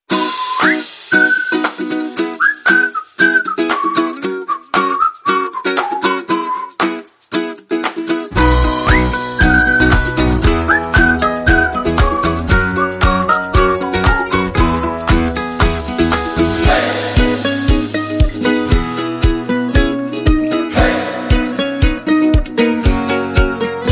Fabulous Ukulele

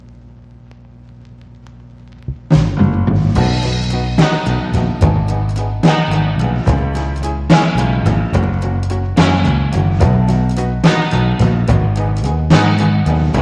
Instrumental

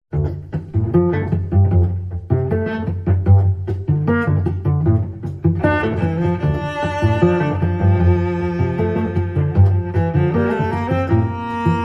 Cello Suite 2 Variation 3

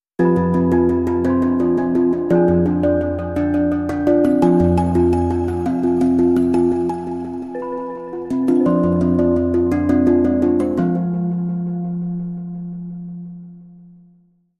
Alarm Condition, Bumper

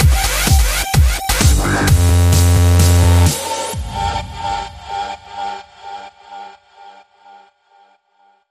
Sting

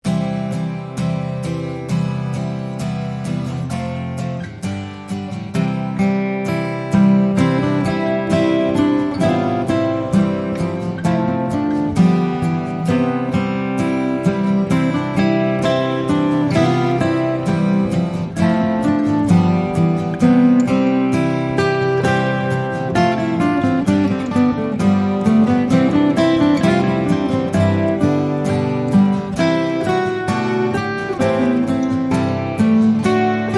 Wachet Auf without Jingle Bells

